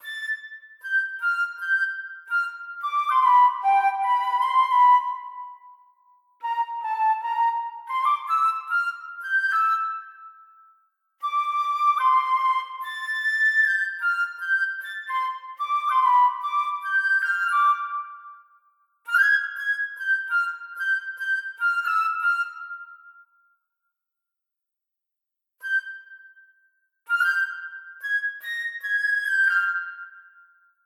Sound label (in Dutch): fluit; Toki Tori Collection